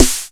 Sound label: 909 sd1a